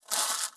paper rip short

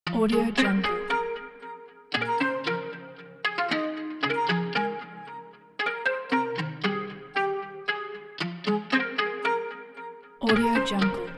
melodic vlog youth pop

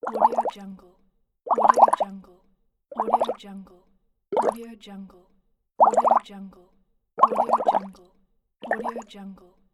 the bubbles water

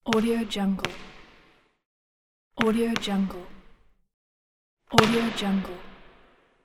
basketball

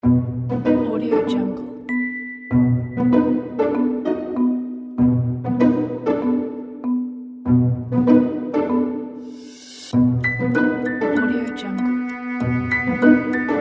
for sneaky

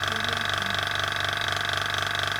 large electric air compressor motor loop

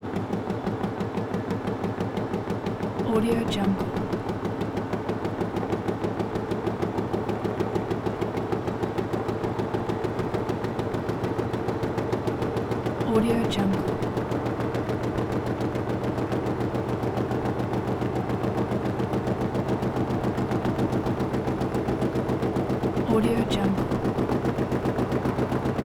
bus constant; prosoundfx

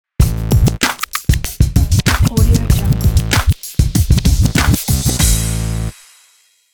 scratch hip hop beat ident

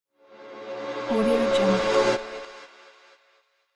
transition complex chord

